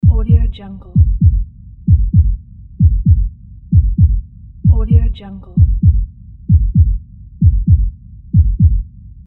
heartbeat loop

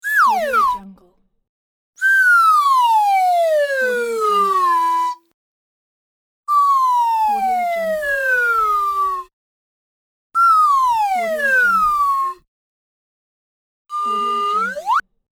comic slide whistle sound pack

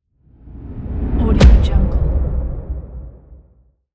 hitting transition swoosh; legatopromusic

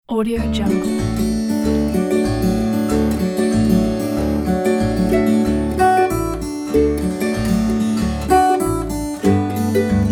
jingle bells bluegrass

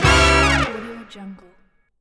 big band fail

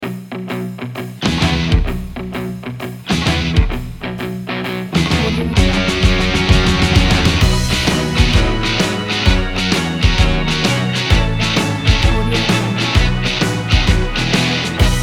positive blues intro

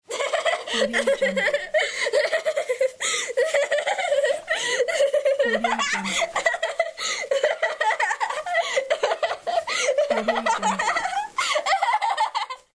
child laughs

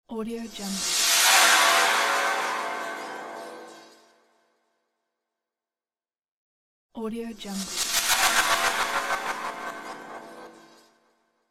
reverse cymbal

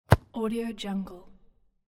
football kicked

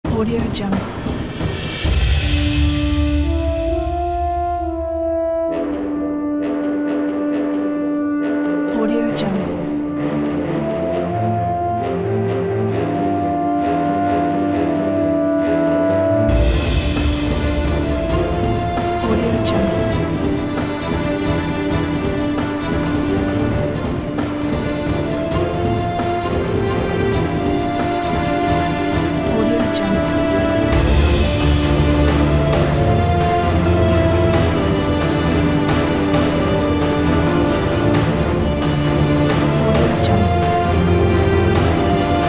frantic cinema action

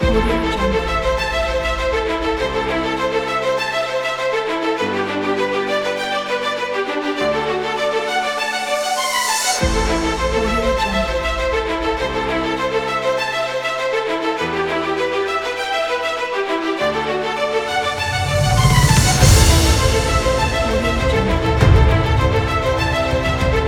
epic inspiration pack

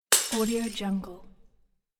broken glass; dragospitaru

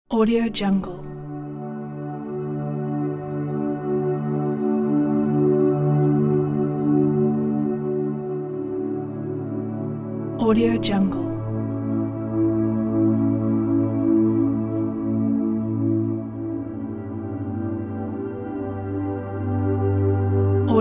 meditation; positive-sound

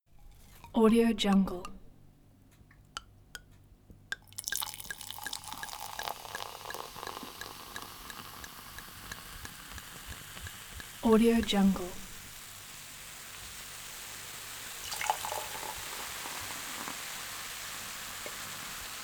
pouring sparkling water; thedreamweaver

